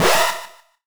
Retro Alarmed; Alarms Blip Beeps; GameSFX

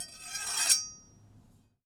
Big Knife Scrape, kitchen knives; Micro Pack

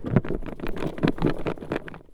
Rolling Bin of Plastic; BB Retail Therapy Sample Pack

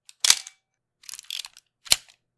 shot wind

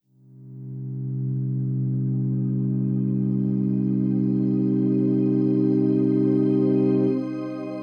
Swelling Pad

